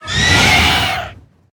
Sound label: Death